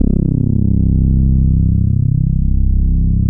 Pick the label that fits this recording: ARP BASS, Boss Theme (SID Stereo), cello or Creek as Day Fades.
ARP BASS